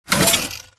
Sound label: Mvmt Servo Light Short; AI Zombie Stalker; Titanfall 2